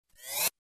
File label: Short Laser Riser